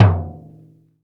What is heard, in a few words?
PRC DAIKO, Misc Drums, World Dance; Spectrasonics; Supreme Beats